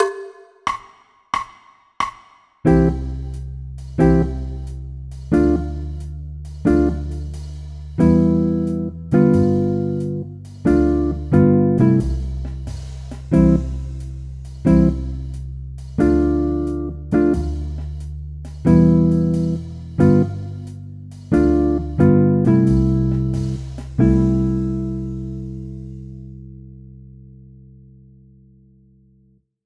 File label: Slow Tempo; Space Dog